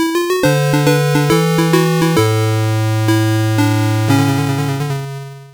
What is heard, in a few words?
Pinball Game Over; Casino Games; Master System